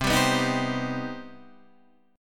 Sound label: C Minor Major 7th C Guitar Half Step Down